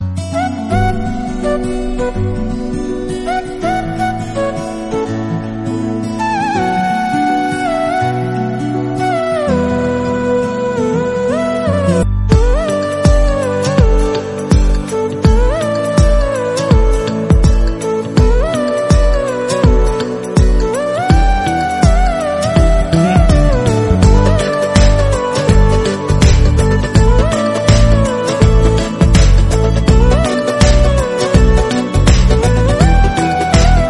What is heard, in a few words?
ringtone